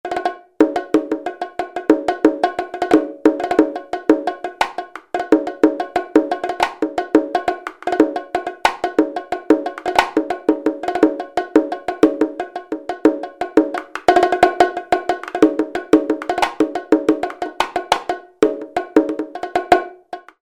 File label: Bongos; IK Multimedia; SampleTank Free